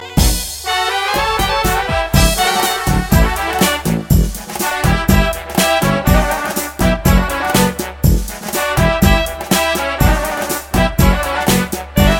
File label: Balkanic